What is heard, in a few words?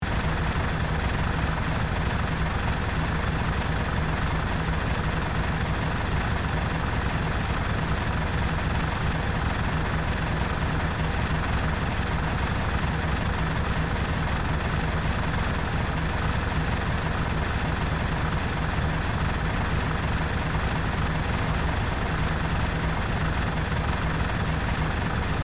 Big truck engine steady idle loopable